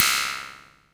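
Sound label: MISC Right, Techno 138 BPM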